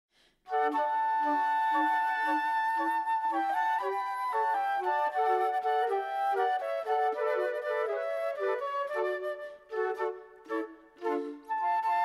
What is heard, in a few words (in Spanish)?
tango cancion